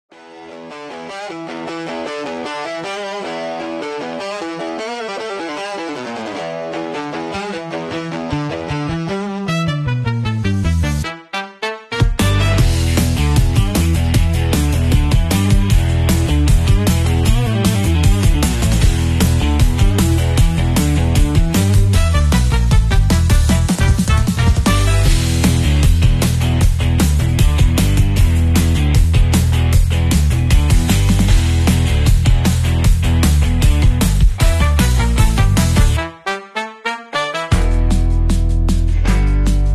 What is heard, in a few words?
softly cracked, zen vibes, instrumental